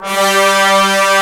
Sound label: ORCH HRN; Greytsounds VOL-1; SYNCLAV TRAX